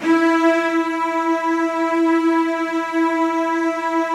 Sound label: Virtuoso Strings, E3; ILIO; Kirk Hunter Virtuoso Strings VOL-2; 90 s Sample CDs